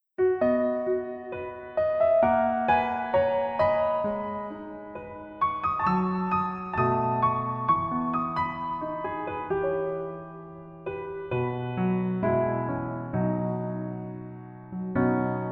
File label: Piano ver, 멜로디MR